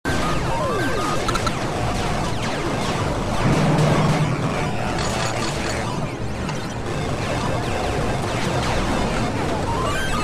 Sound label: arcade